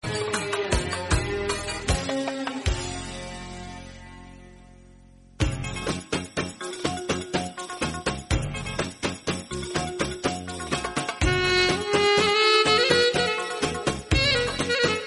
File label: oldest arabic ringtone